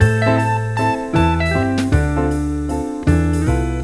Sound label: jazz, ringtones; Google; NewWave Labs